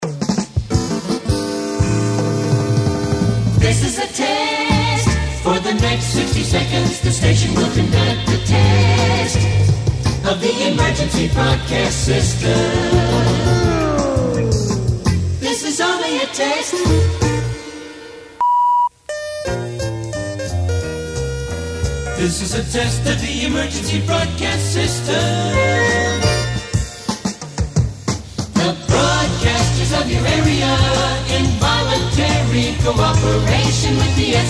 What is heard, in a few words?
Singing Test Jingle; EBS